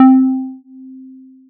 soft fm pluck

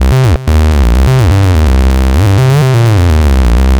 aggressive acid lead loop 126bpm 2 bars

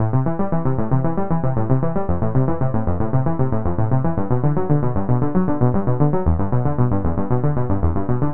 retro synthwave arpeggio 115 bpm

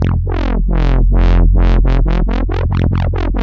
140 bpm dubstep wobble 2 bars

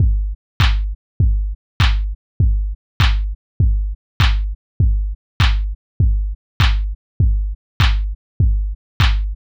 simple kick and clap drum loop 100bpm

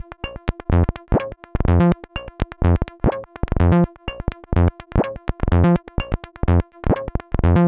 squelchy acid bass loop 125bpm

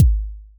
garage kick with a bit of reverb

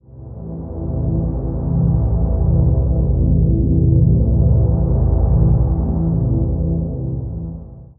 cinematic drone am; 8s

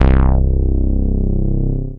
warm analog moog style bass hit c

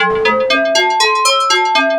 glassy pluck melody am decay

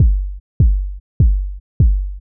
deep house kick warm punchy 100bpm

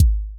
classic disco kick bright and round

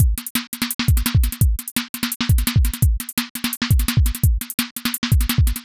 170 bpm jungle drum break 4 bars

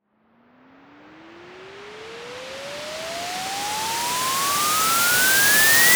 rising white noise sweep for transition

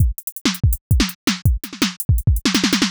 chopped jungle breakbeat 165bpm 2 bars

